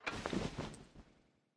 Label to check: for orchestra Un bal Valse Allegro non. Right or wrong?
Wrong